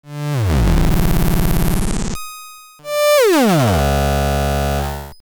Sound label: Random Sound; Europa